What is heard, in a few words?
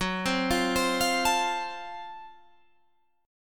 F sharp Minor Major 7th Flat 5th, Guitar, Drop D